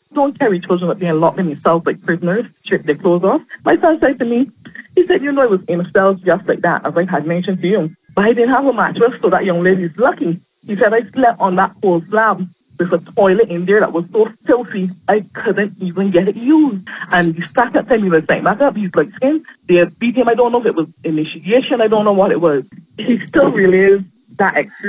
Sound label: beat